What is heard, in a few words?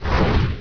move